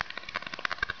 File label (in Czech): kroky